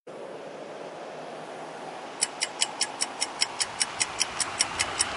Clock Sms

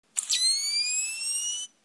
Camera Flash Charge

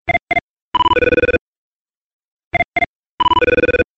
Telephone; 24 Ctu

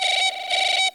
Phone

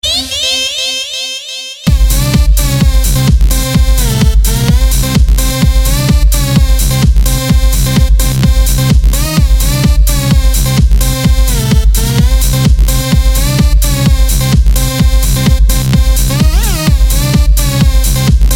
Electro Club Music; Electro Club Music 3

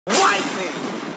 Lightning

